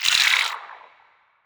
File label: damage; Barotrauma; Spineling